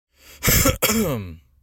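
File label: scratchy throat when ill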